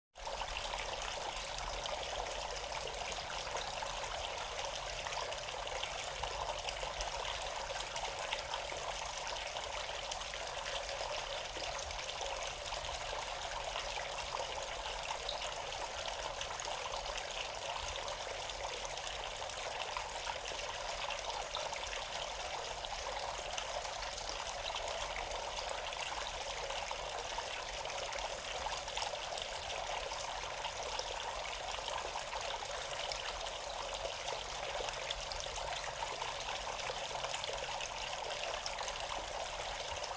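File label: small fountain in the garden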